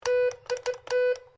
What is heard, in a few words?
MORSE CODE X; Morse code aplphabete; Morse Code (Sound Effects:Electronics)